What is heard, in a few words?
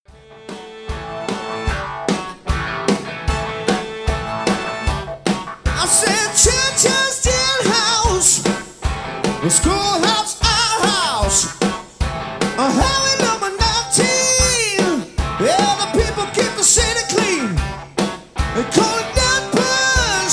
Male Vocal Montage